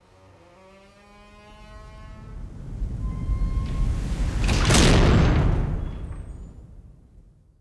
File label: VAULT DOOR LARGE